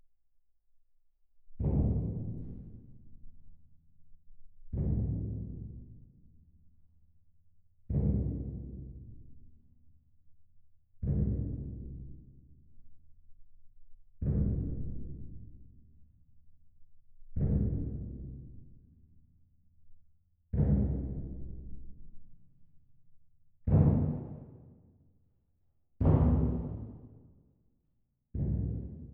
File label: Twin R Perc